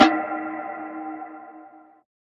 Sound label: percussion verb big